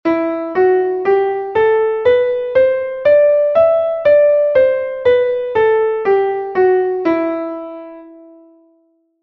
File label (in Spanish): mi menor natural